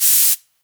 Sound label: Air, cartoon Foley Fx; musicradar; cartoon caper